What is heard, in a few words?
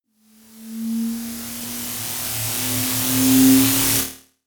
noise sizzle long, risers